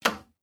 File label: switch